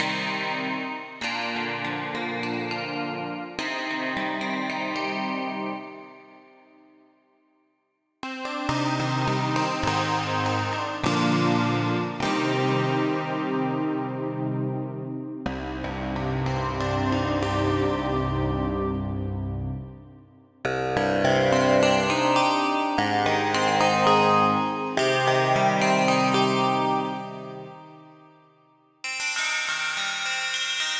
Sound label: plucked